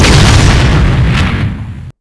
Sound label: Explo